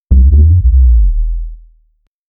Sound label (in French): un bawong comme dans les, bubble